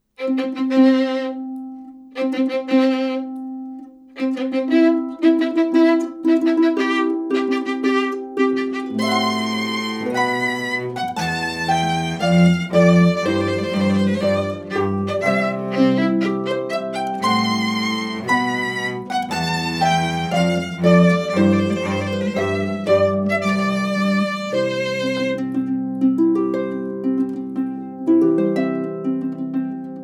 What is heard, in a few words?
Wedding March